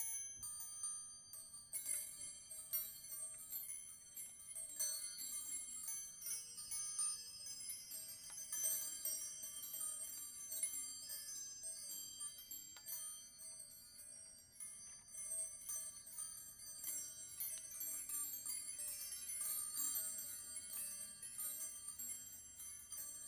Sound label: Wind chimes magical; moonlight maps battle pit